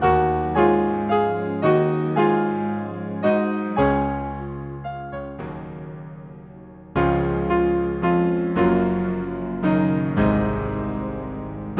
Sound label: Hymn; Breathe on Me, Breath of God; Hymnal 1982